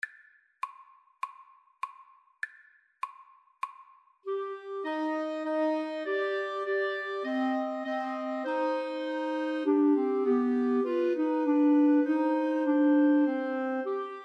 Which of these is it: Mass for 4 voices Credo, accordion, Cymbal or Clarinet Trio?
Clarinet Trio